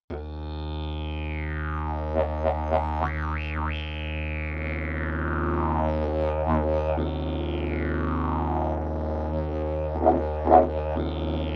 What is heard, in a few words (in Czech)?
olse stromova, didgeridoo